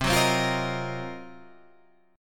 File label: D sharp Minor 6th Add 9th C, chord